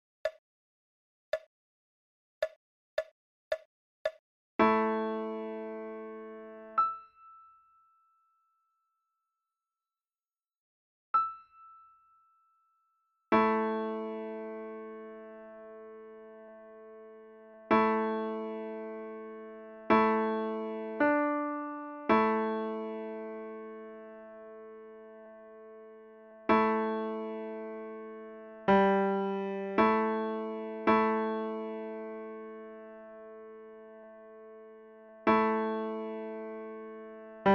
My Musette left

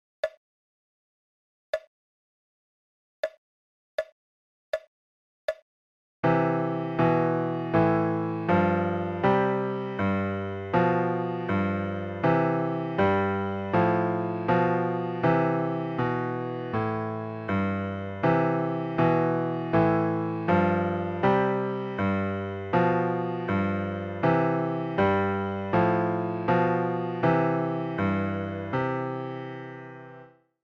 Duet; Au Clair

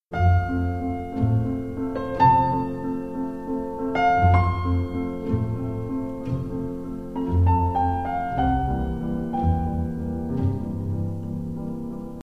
Pno Concerto

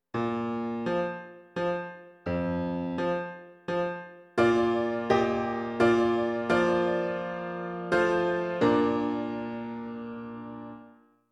Piano

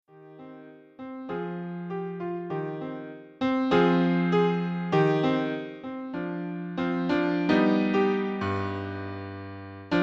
Tango

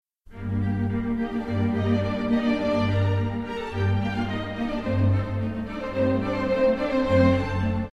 G Minor Maj 6, intervals

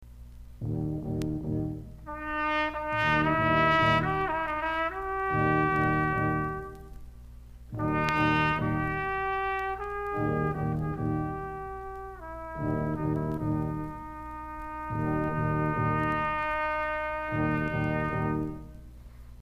Doina, Harm scales

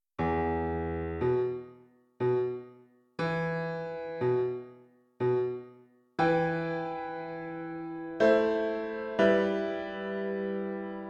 Piano

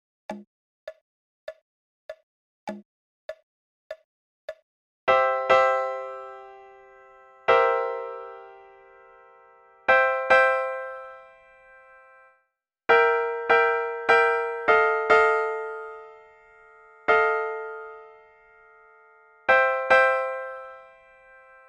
Blues right

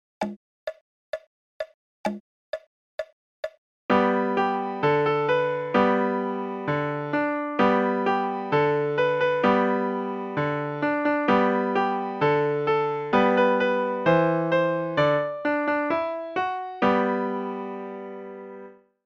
Five Fat Turkeys

